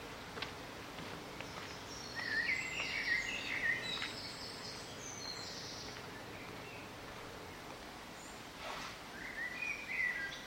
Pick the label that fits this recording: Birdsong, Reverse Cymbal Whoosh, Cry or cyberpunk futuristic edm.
Birdsong